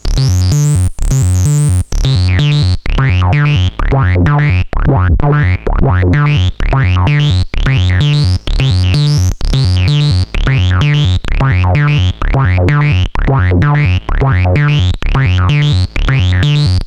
Liquid Foam Loop; HerbsAndStones

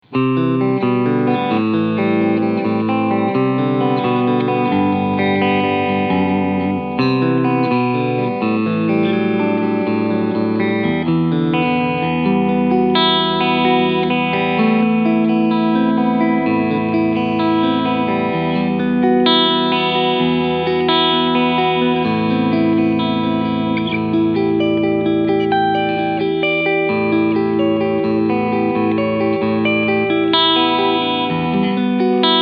Tribute S-500 Cleansound Front Steg Push Pull

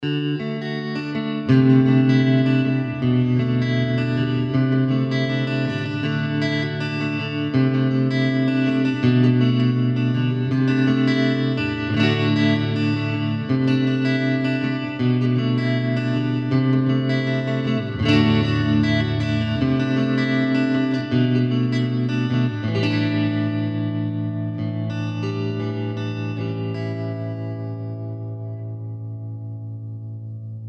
Amplitube Max Preset Fender Vibro King

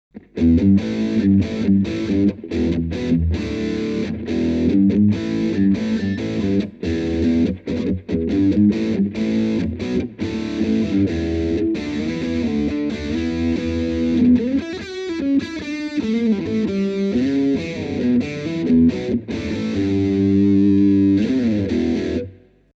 recto

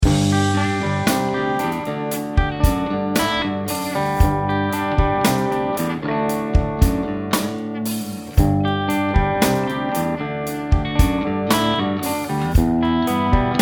Clean

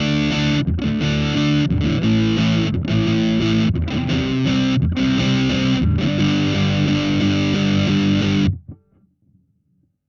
Big Muff Cab Sim Bass; Amplitube X

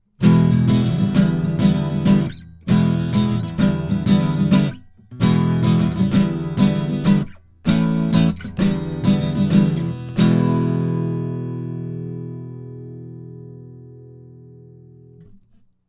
Acoustic Guitar Chandler EMI REDD47

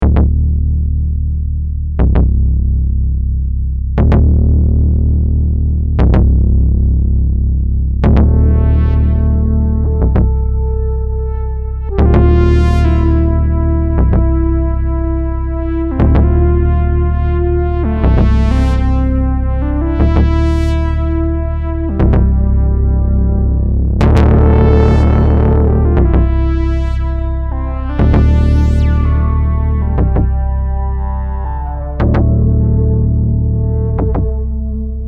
SubPhatty

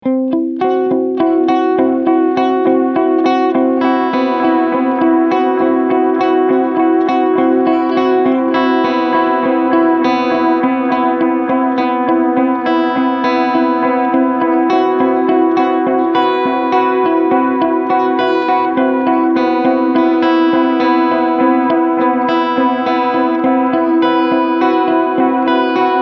Long Delay

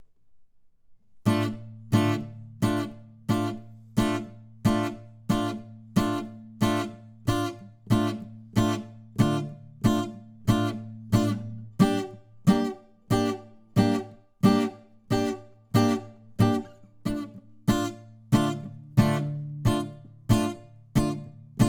Acoustic Guitar Original

